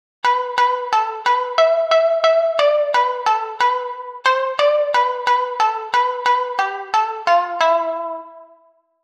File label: phrygian